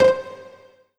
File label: Alesis S4 Plus Wide Pizzi C5